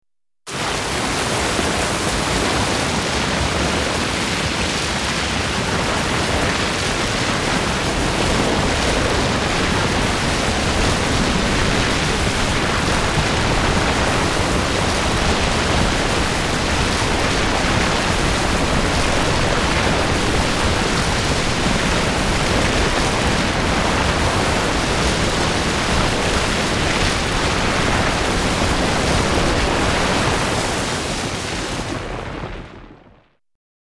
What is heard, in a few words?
Avalanche Snow Slide Down Mountain